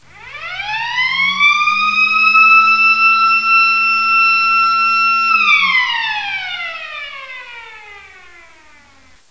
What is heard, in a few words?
siren; cstrike; de tabocas